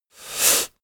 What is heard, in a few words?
inhale male